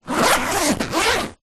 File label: foley bag backpack zipper; zapsplat